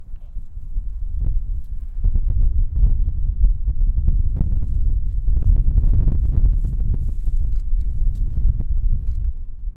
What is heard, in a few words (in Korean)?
바람